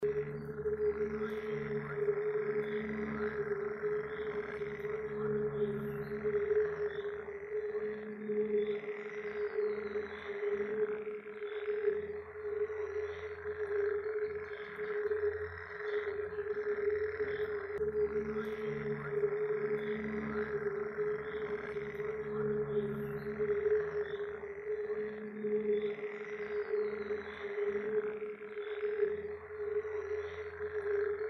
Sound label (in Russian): zvuki bolota